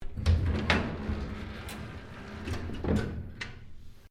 BRIDGE DECK HATCH OPEN, Sounds onboard HMAS Castlemaine; Boat Sound Effects; Boats (Sound Effects:Vehicles)